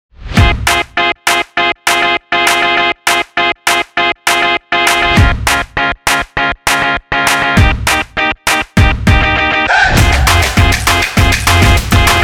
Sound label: Stomping Beats